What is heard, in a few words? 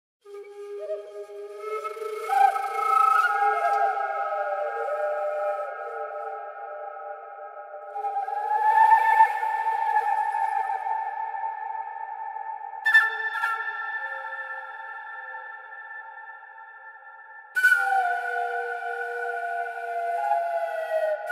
Haunting Pipes; Age of Conan Secrets of the Dragon's Spine